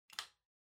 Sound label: Light Switch-American Toggle (Wall-Gentle)